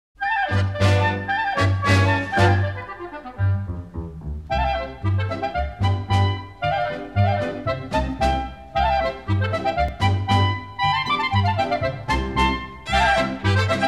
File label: Swiss Polka